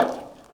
bleacher step; Golden Crayon Sounds

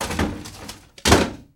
locker store item; Original TK Sounds

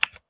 keyboard type; Golden Crayon Sounds

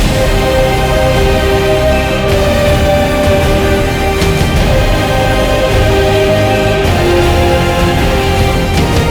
music loop; Golden Crayon Sounds

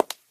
marble step; Golden Crayon Sounds

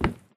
wood step; Golden Crayon Sounds

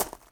gravel step; Golden Crayon Sounds

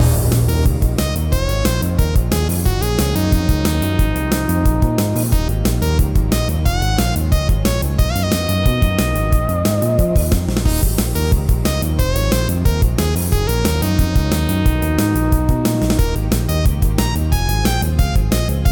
tamer battle; Manamon Music; Manamon 2

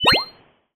join; TTCom Soundpacks

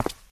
concrete step; Golden Crayon Sounds

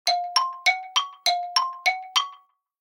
Time Passing, iOS Sounds

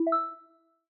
scan complete, iOS Sounds

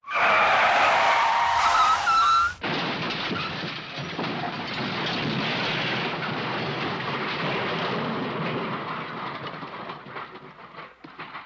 accident; RS Games Sounds

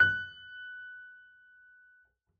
piano f#; Golden Crayon Sounds

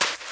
sand step; Golden Crayon Sounds

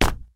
tile fall; Golden Crayon Sounds

